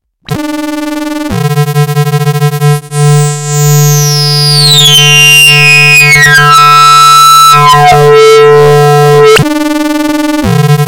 Enzo Mono Ring Modulator

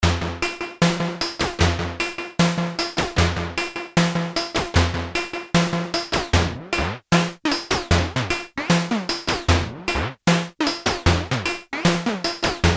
T REX Replicator Module Moog FX Time Modulation

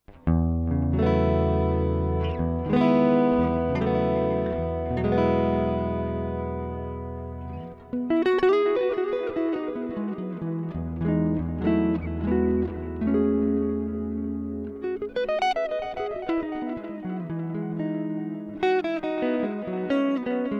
stereo tape; NUX duotime

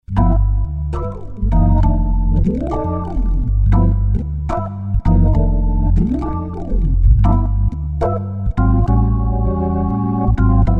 Crumar Mojo Desktop; Killer Mojo; Max Tempia

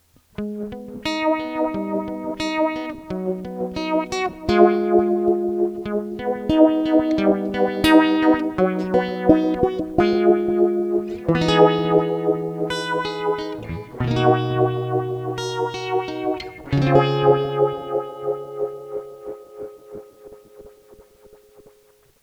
Harley Benton TravelMate E Fusion

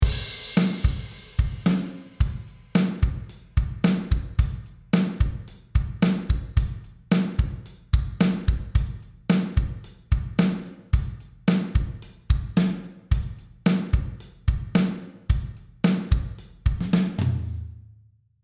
altiverb 8 xl drums chapel studio tilburg; audio ease

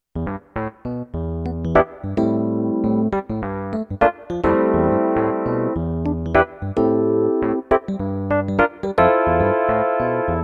PSR-S670 Suitcase EPiano

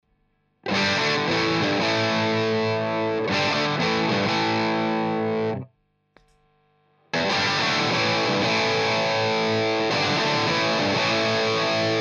Screamer on off; JHS

